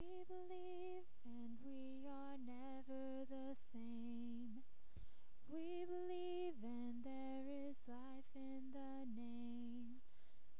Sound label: Vox Recording